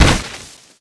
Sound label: wood pole soft, Impact; Cars; XBOX